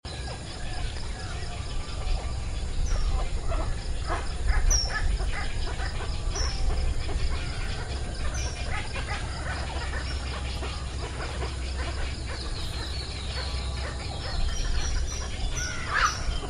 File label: three birds